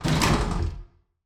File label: Elevator Door Open